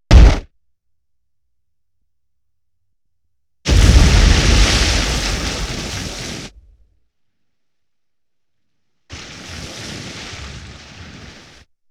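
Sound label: 5.1 explosion, centre channel; Sony Pictures Sound Effects Series; Explosions - 5.1 Surround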